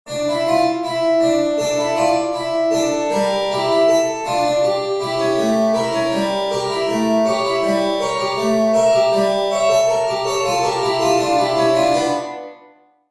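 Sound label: harpsichord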